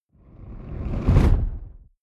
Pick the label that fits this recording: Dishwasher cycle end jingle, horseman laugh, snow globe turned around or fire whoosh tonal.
fire whoosh tonal